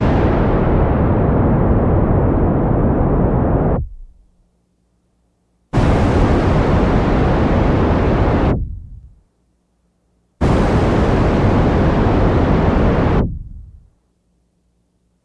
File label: Starship Docking